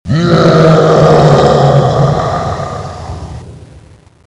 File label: zombi chinese death